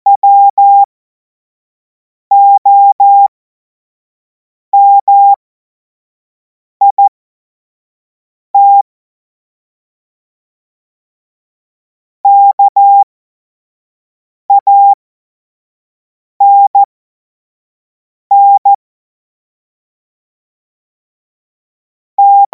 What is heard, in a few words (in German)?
Witz, cw morsen; Morsekurs